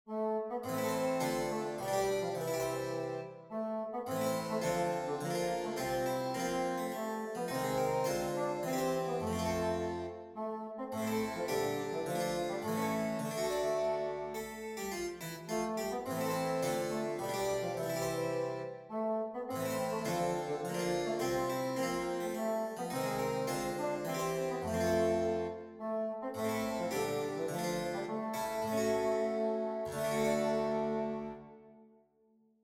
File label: duet cembalo fagot; Levende musik for boern